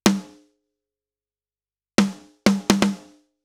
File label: Snare Process API 2500